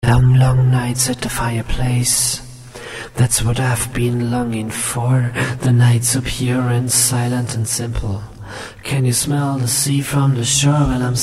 gesangstrophe

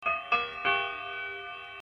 klavier; djtyro

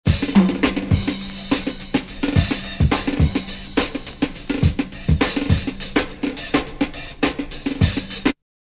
filmmusik

